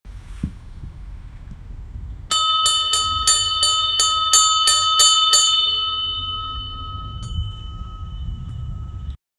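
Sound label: a bell ringing to indicate; triumphant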